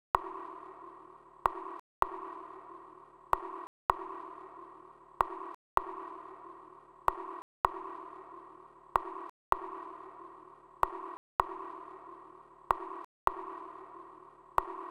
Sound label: perc reverb, Key D#, Bpm 128, Big Room House; Loop Nerds; Big Room House Allstarz